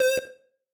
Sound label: synth